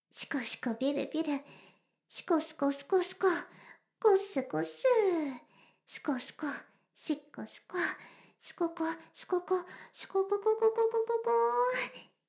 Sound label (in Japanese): シコシコ音声; ASMR; 魔法少女マスカリマッスル